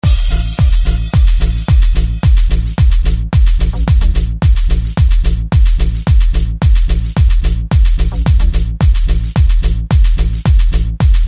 Techno sound into a sequence of short but good power.; After The Door